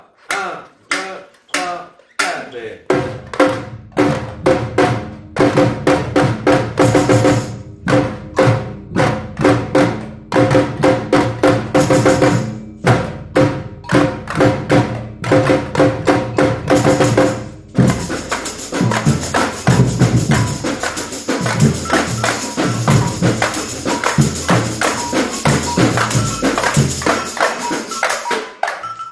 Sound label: break tutti, samba reggae